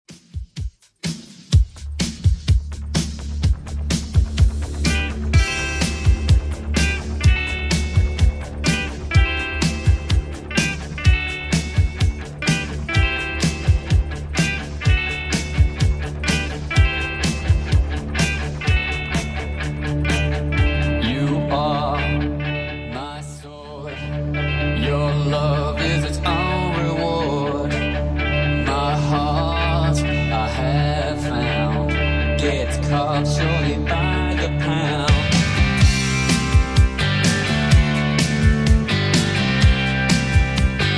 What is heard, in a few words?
80s Redrum 126bpm